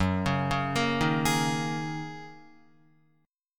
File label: Gb 6th Add 9th Gb down Guitar; Listen to Gb6add9 arpeggiated